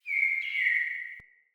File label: Cardinal Female Bird Song